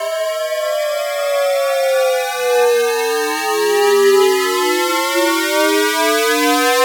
Riser Prism; One-Shots; SFX; Ghosthack; Color Bass Essentials

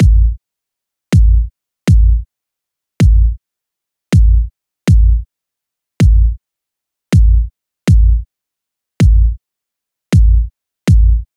Hip Hop 80 Kick; Drums; Drum Construction Kits; Loops; Ghosthack; Drum Mega Bundle; Drum Hero 5; Kit Hip Hop 1

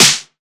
Snare, techno drum shot

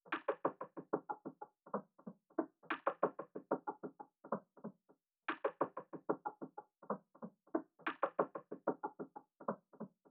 Drum Loop 93 BPM Percussion, RnB; Barely; Cymatics; ANALOG EVOLUTION Beta

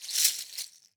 Cocoon Shaker, World Percussion; Cymatics; Emperor World Percussion

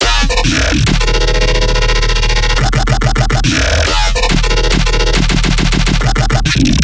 Riddim Bass Loop D# Steppy (140); Bass Loops; Ghosthack; Riddim Essentials 2020

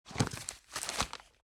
Large Heavy Book with Pages; Fairlight Sound Lib

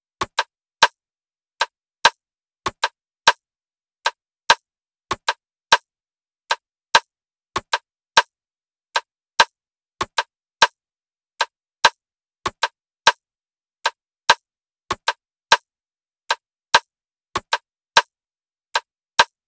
Drum Loop - 98 BPM Percussion, Afrobeats; Loop Stems; Cymatics; PARADOX II - Drum Loop Collection; Artist Drum Loop

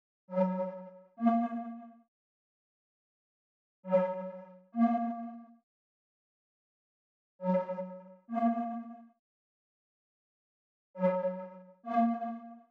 135 BPM D# Min Counter Lead, Loop Stems; Melody; Cymatics; 2022 Melody USB Expansion; Serotonin

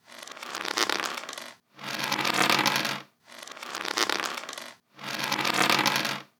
Percussion Loop, Percussion Foley, 150bpm

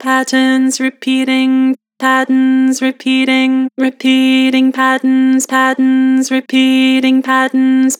Flat Patterns repeating, Harmor Vocals

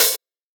Open Hat; Drums; HiHats; Ghosthack Advent Calendar 2017 Day 5

